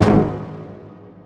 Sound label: brass